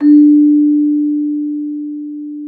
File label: Celesta D4; Xsample VOL-8